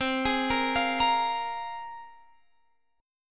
C 7th Suspended 4th Sharp 5th, C, down, Guitar, Standard; arpeggiated; C7sus4#5